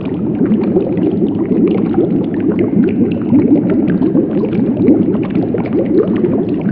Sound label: brain run, ambient